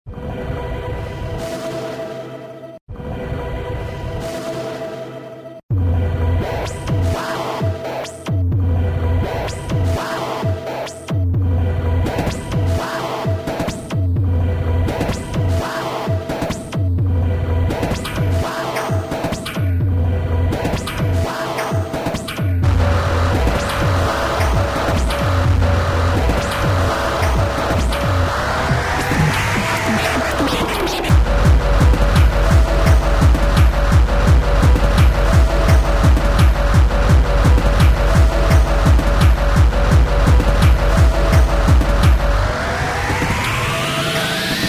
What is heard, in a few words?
hardcore